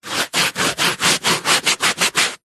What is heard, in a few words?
household sponge large wipes rubs cleaning med; zapsplat